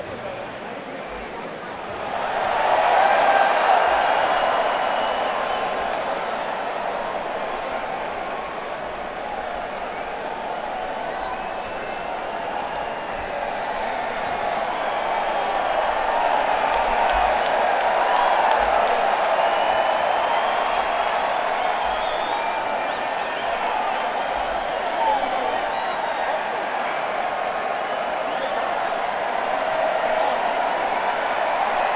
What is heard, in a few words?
Crowd, Madison Square Garden; New York, NY